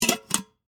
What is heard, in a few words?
Teapot Lid Close, Household, Teapot, Lid, Close, Empty, Metal; Kitchen; BlastWave